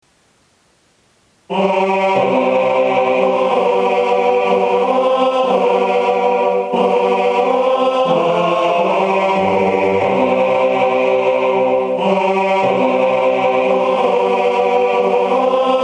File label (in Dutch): Sanctus Benedictus TENOR-BAS; Sanctus en Benedictus van Johan Michael Haydn; sintjanskoor